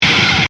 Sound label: Laser Distortion; Production Element, Imaging Element, Accent, Transition